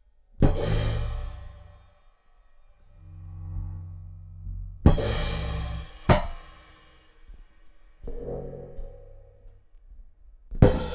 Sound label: notes durations